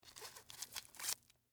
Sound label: Foley, Chewing, Gum, Package, Remove, Stick, Of, Gum; Eating